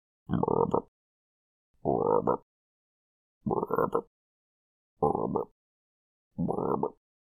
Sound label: Ribbits